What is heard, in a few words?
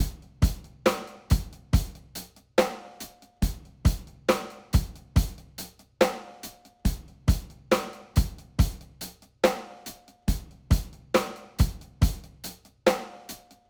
rock